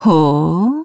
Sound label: hooo; Beatrice